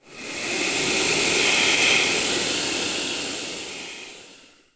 steam; DAoC

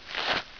gravel shuffle; hereticiidemo; player

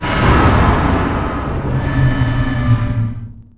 Animate Dead; Age of Wonders

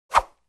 woosh; WMBonusXP

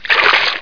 BATH

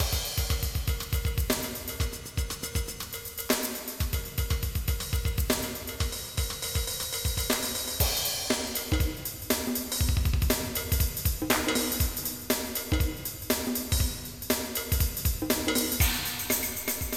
just drum

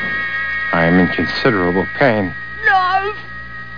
pain

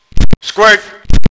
SQUIRT; APACHE

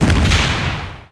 Flak Bullet Hit; Red Shark

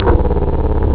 fuzz, synth bass

